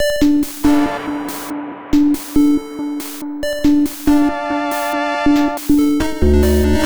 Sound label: Combo D, 140 BPM; CHIPSHOP DELUXE; CHIPSHOP LOOPS